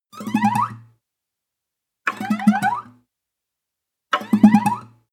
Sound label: string chirp slide up multiple